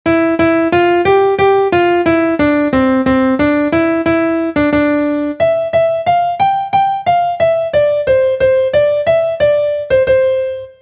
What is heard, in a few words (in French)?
hymne Piano